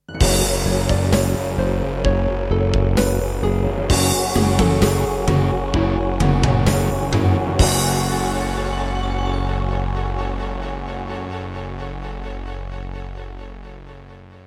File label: Roland SC-8820 55MAP; Doom (Sigil); Introduction